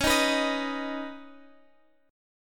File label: Db 7th Suspended 2nd Db, down, Guitar Standard; strummed; JGuitar